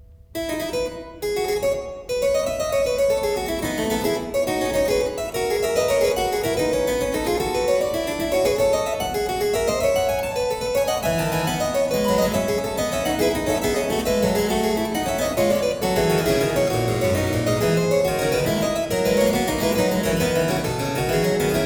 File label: The Well-Tempered Clavier Book II Prelude & Fuge No.11 in F major; J.S. Bach; BWV 880; II Fuga; Johann Sebastian Bach